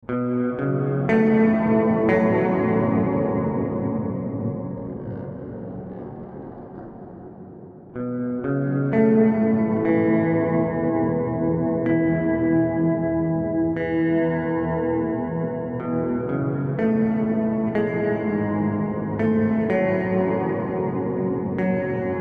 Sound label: Reverb Anti Shimmer; Specular Tempus